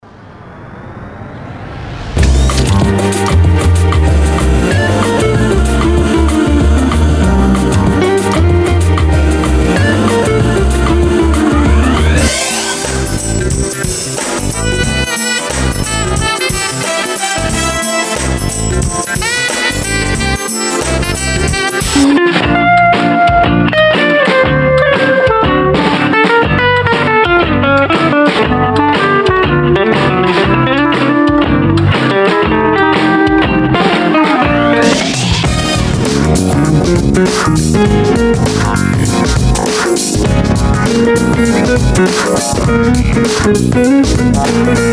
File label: Jazz Mix; otel